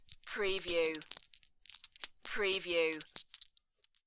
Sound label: Marbles Handling Foley